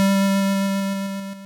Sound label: Synth Stab G2 Digital Chiptune 8 Bit